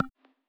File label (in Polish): Dźwięk Przycisku